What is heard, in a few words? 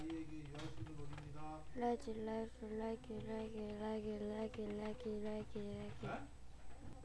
laugh